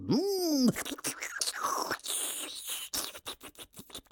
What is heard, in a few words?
AMB China 3D Amb